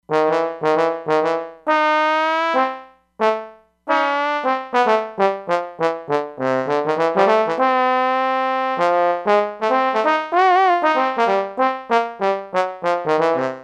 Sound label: Trombone